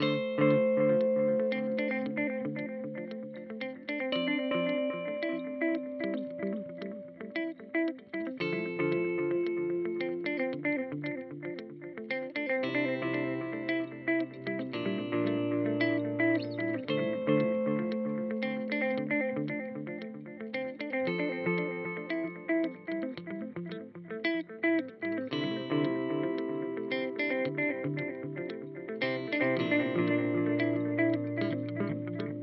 Boss DM 2w Delayzeit Mittel

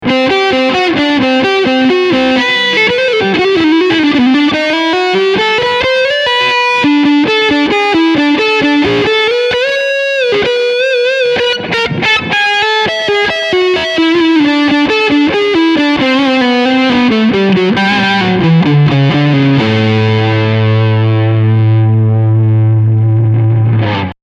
Leadsound Front HB

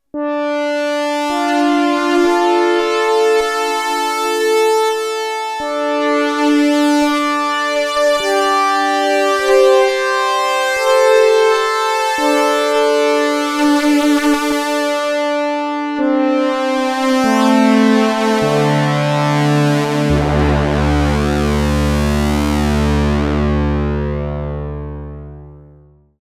fette flaeche

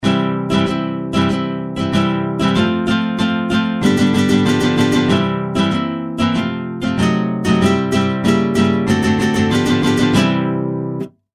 Chord Mode Einfach

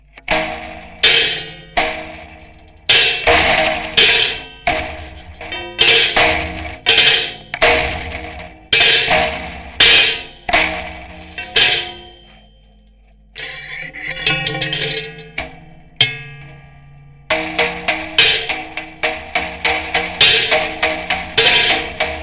Soundbox Mk2 Uhrfeder auf Stab anderes geschlagen

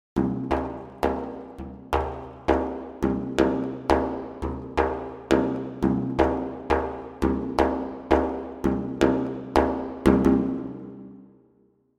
Rahmentrommel

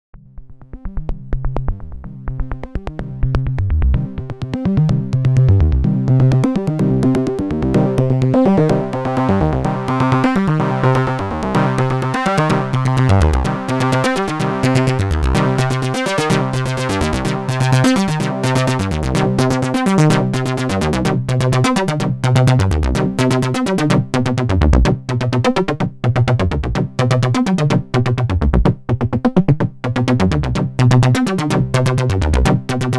Sequenz mit Para Chord; Dominion 1

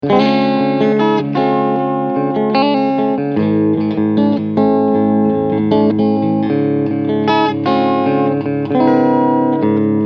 Beide PUs SC Clean

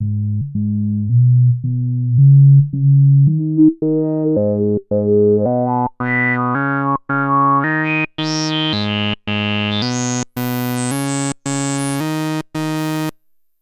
Genus Poles Wechsel; Rossum Electro Music Evolution

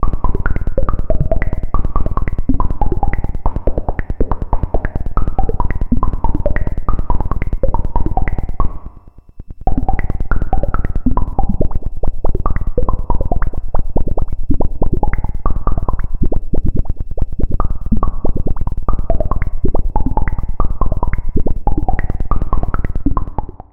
Pocket Operator Modular 400 nur ENV auf Filter Reso VCA; Teenage Engineering

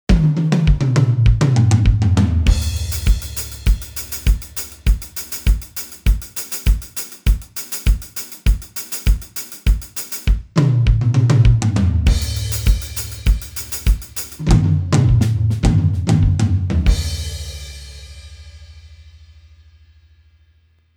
Stil Spanish Rumba

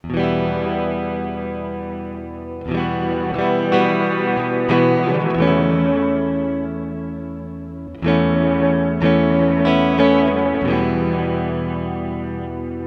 Binson Echorec E Gitarre Swell long; T-REX

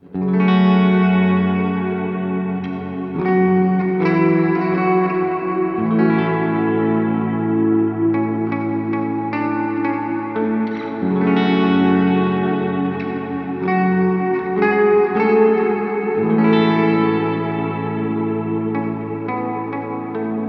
Earthquaker Devices Ledges Hall alle Regler auf max

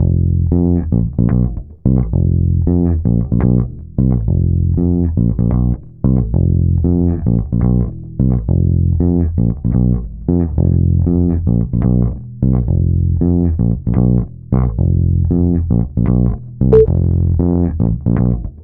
E Bass schnellste Release Attack

